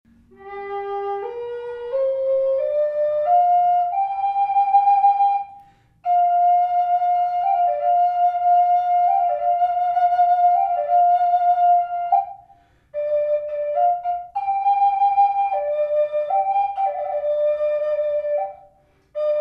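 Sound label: reverb, flute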